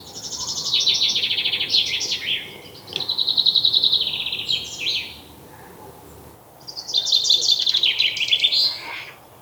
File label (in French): appels pinson